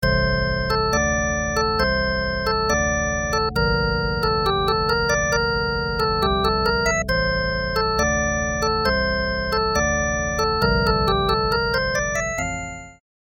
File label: fantasy organ